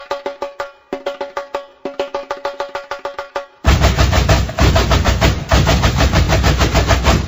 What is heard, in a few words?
samba